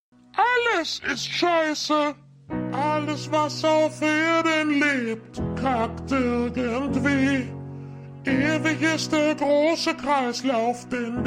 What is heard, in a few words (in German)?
Gesang